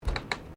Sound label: Footsteps Bare feet Wooden Deck Step; Footstep Foley; Human